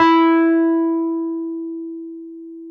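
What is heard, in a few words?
Wurlitzer electric piano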